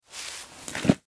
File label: Sand Pail Scoop, Sand Pail Scoop Toy Children Bucket; Dirt; Foley; Human; Blastwave